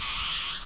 shock jump; shockroach

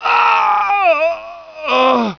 death; fgrunt

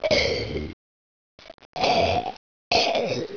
die; svencoop; hunger; hgrunt

